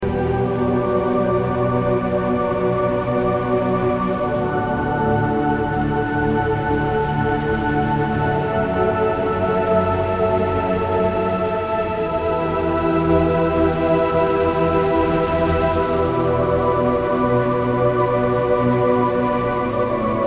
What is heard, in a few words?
Meditation Instrumental